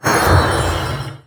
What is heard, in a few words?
Spell Small Positive